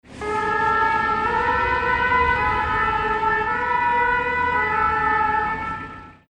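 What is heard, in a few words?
firefighters; pompiers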